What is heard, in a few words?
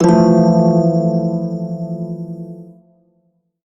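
Prime Shawzin Major Chord, Music; Warframe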